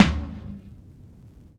Vinyl Tom

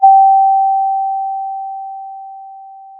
pop, Sound of a school bell

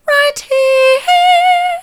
right here soft, vocal

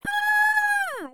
surprise peak; VIVAE; vocaltrax

